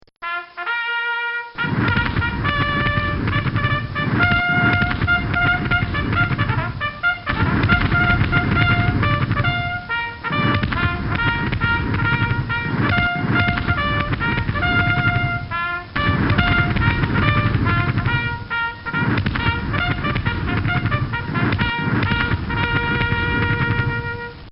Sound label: Flag Raising